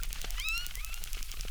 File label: Chirp, acquarium